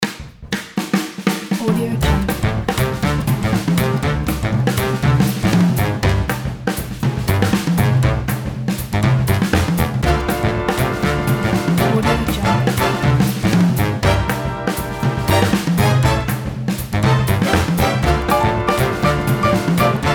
big band; pianodays

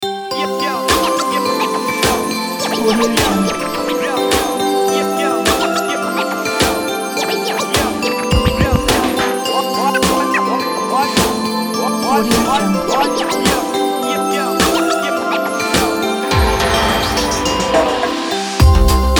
we wish you a merry christmas hip hop

